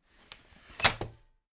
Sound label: folding chair fold medium